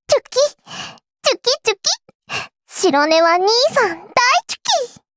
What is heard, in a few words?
applause